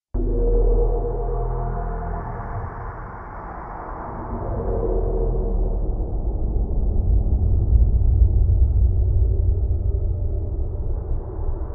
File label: Growl Deep Hollow Impac